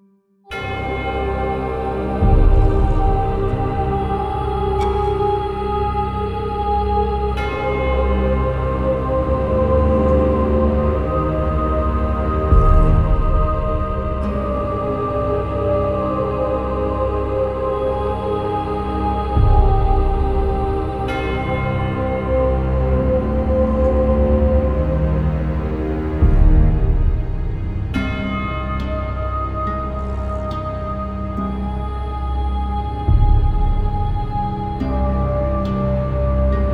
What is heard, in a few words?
Dark Disturbing; Treasure